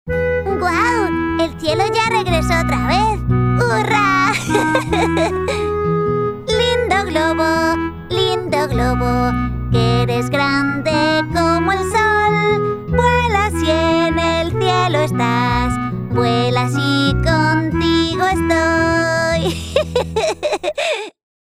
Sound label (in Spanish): VOZ NIÑA CANTADA HABLADA